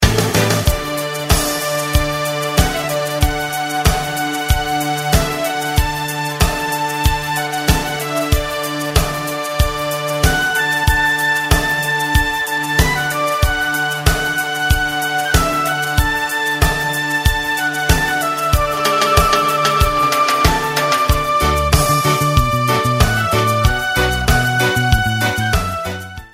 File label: Cool Bag Pipe